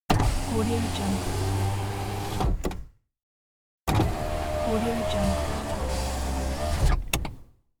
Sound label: car window